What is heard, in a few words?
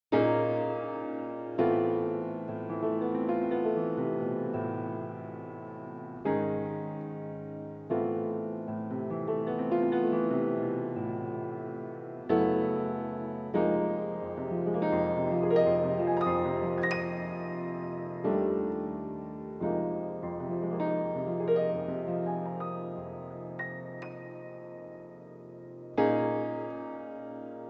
piano